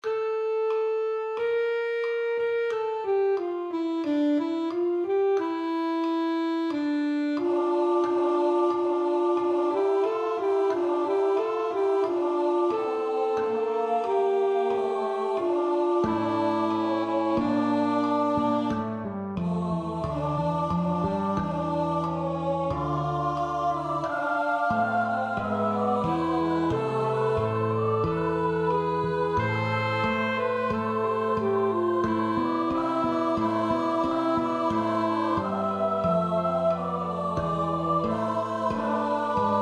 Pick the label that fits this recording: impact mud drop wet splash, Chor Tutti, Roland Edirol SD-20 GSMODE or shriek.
Chor Tutti